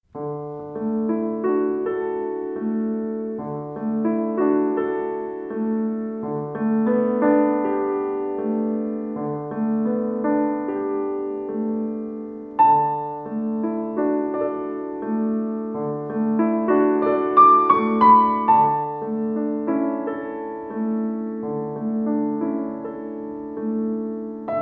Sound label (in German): Piano Impressionen; Ed. Heinrichshofen